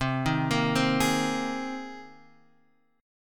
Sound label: C Minor 7th Sharp 5th, Guitar, Half Step Down